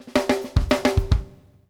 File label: off snare, drum fills